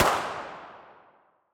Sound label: 25 Cal Pocket Pistol Gun Shot Fire; Nova Sound